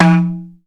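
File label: drum, Ethnic Perc; Dance Synths; Percussion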